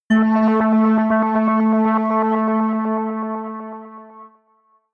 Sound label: synth hit rhythmic scatter high, hits impacts; whooshes rises